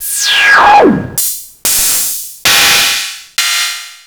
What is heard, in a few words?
Cymbals